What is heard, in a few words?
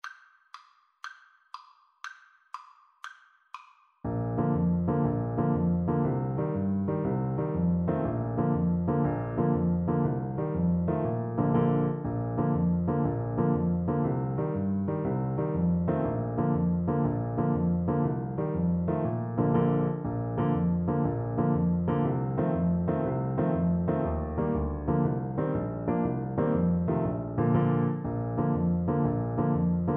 irish jig medley, saxophone